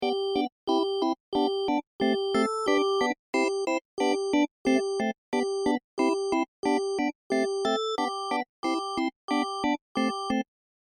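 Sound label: barrel organ; maslenitsa; sharmanka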